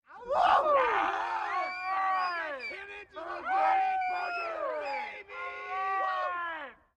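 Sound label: Male Catcalls Whistle; medium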